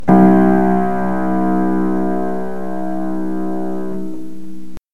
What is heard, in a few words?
Piano